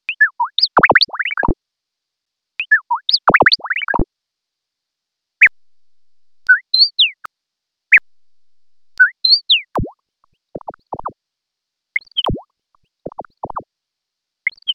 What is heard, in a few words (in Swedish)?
angskulla; Swedish birds; Sädesspov och andra fåglar